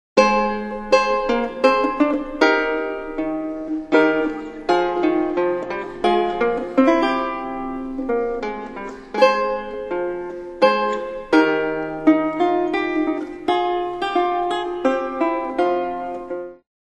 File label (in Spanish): laud; guardame las vacas; mudarra; La galatea, II